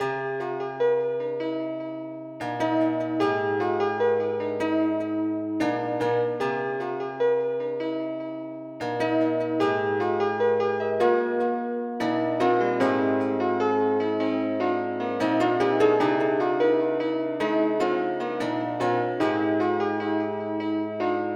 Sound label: piano